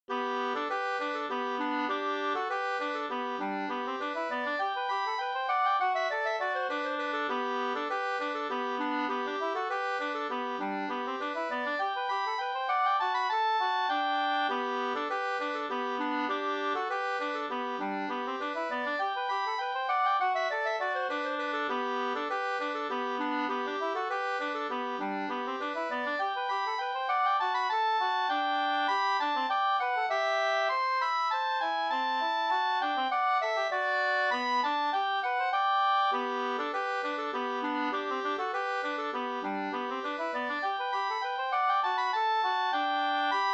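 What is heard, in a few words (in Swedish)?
slangpolska, two-part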